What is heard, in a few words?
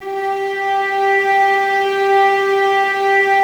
STR VAS G, Violas, No Vib; Miroslav Vitous